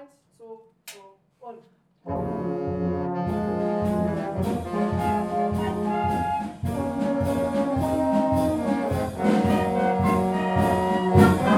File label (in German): Polka-Trio, Musikverein Proben